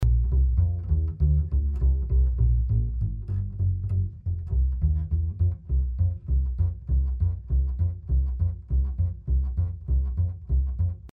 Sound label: Upright Bass Up